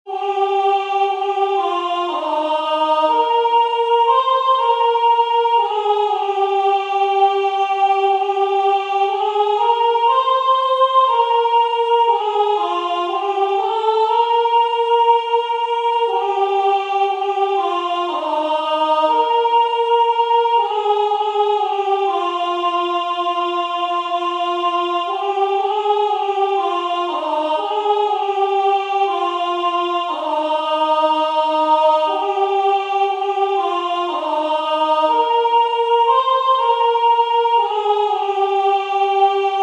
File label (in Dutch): BLIJF MIJ NABIJ Soprano